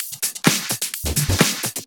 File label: Houseloops 128BPM; Vengeance Samples